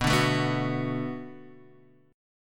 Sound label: D 6th B, chord